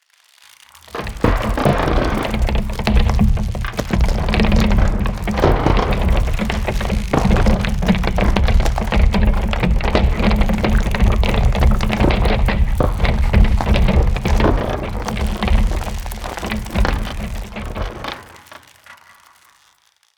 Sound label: ice medium pressured